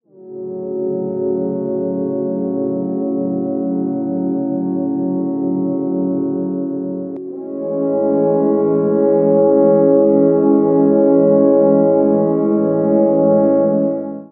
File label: Bpm134 Busy Chord Loop D A, Dubstep